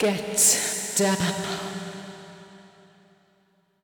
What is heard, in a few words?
VOICE GRL HOUSE 125, House Voice; eJay